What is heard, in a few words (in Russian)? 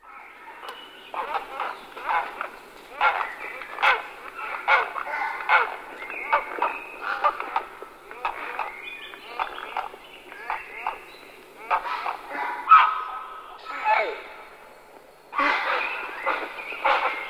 caplia